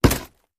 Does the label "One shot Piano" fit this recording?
No